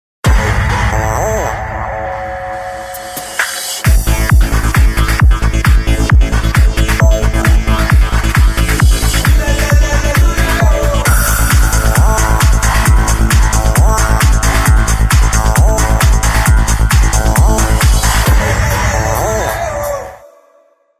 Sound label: techno